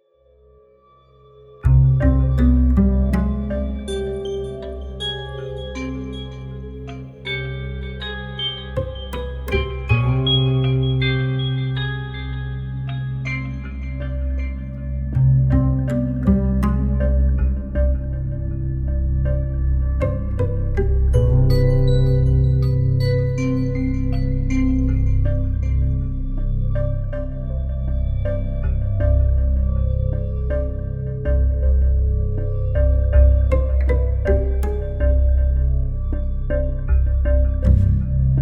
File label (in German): Suspense mit Pizz